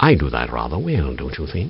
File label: Cheer; svencoop; voice commands